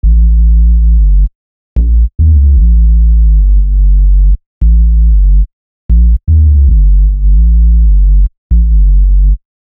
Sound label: bass tech house; electronic music